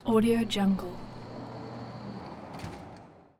airport electric door noisey airport terminal; urban sounds; درب برقی فرودگاه

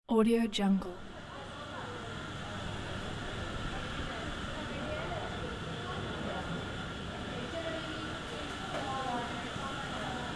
coffee shop; urban sounds

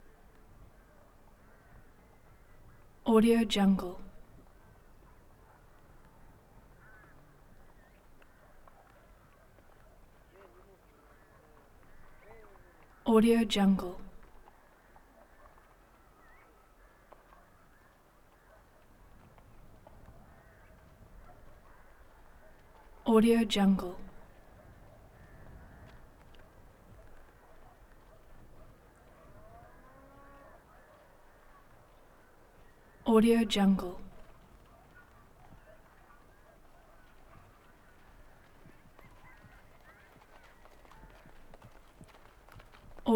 military cavalry horses town background; game

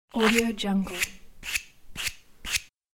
pumice scrubbing cleaning; domestic sounds

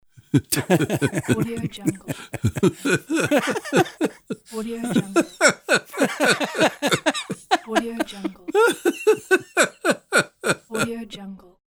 two men chuckling

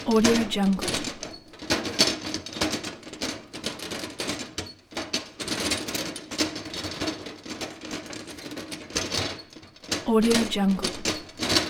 male breathing continuous heavy breathes tired; human sounds

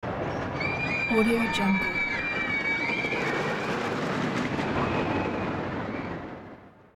roller coaster screams; شهربازی

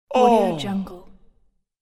male short release yell; human sounds; فریاد کوتاه مرد

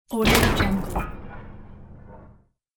damage mechanism close hit; cartoon

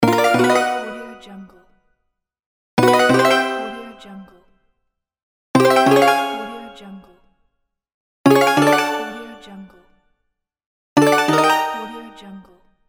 extra score; game sounds; اضافه شدن امتیاز

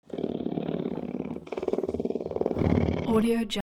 cat purr relaxed; خرخر آرام گربه

